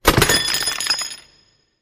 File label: Organ Jar Glass Hit